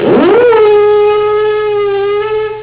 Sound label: horn warning